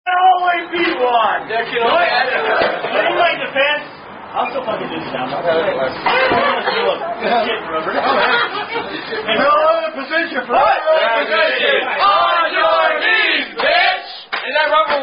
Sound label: Chant; Face Down Hash Hit; Suck Swallow Spew